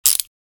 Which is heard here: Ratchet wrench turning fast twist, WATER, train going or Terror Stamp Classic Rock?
Ratchet wrench turning fast twist